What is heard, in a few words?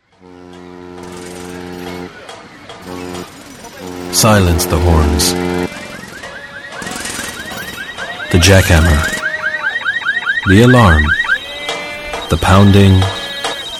STREET